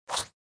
Chomp Sound; sushi cat

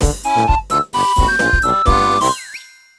Home Menu Banner; streetpass mii plaza; 3ds

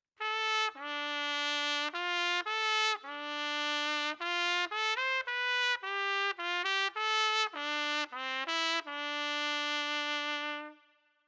Trumpets

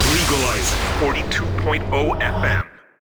Radio Hiphop Jingle; Gangstar West Coast Hustle; android

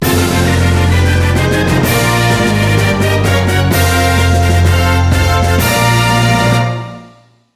Jingle ~ Win; mario super sluggers; wii; Mario Grand Prix

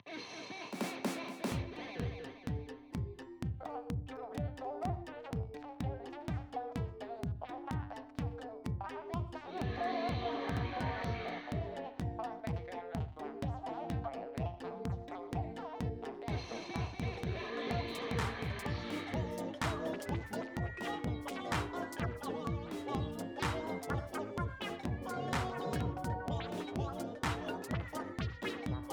BGM Coop Menu Sub

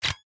SOUND GUI OVER

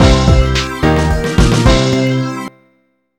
Jingle Ok; Photo Phantasy

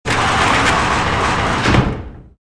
Door Metal Slide; Lucius II: The Prophecy

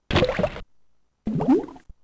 Plok!; lemmings; x68000

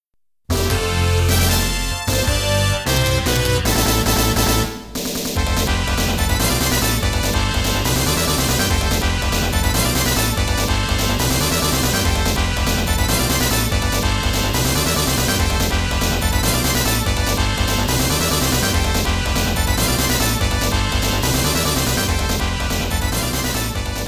All Clear; Konami Music Masterpiece Collection

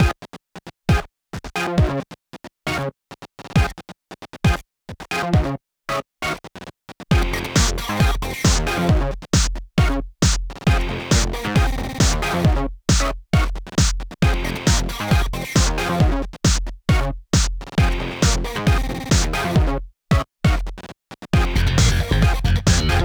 Radio Electro; Gangstar West Coast Hustle; Android

